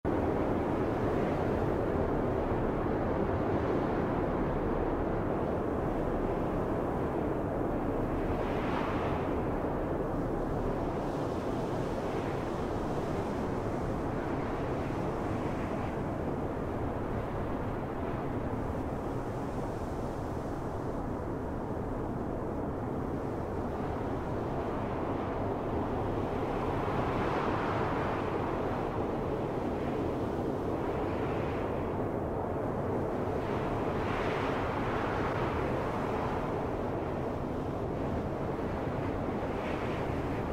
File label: airy space; baileywiki maps premium towns